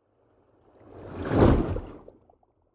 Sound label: whoosh abstract small